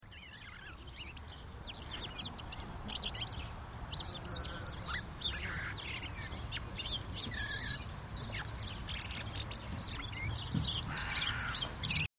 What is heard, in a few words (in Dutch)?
Merel Turdus merula Geluid; Annelies van Klinken